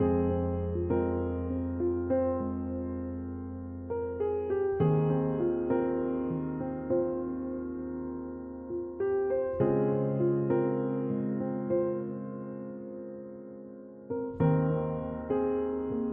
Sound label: Dramatic Piano; bakemono demon brigade tenmen unit 01